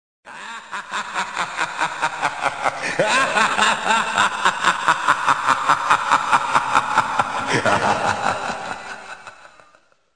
joker laugh

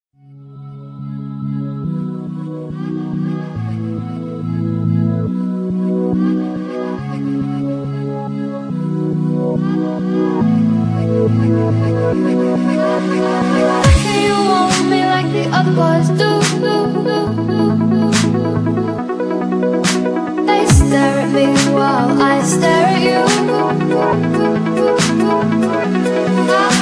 dubstep remix

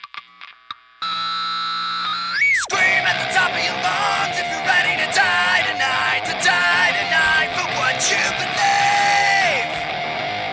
scream; christian

